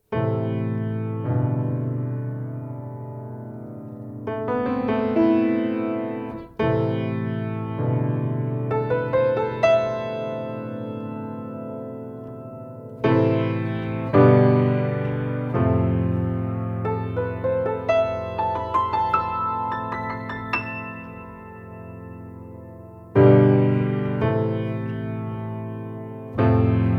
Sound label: Piano; AMI V78M WPK